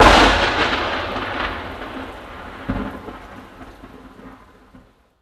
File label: Thunder Clap Gun Sho